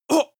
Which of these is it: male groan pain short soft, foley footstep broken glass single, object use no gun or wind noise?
male groan pain short soft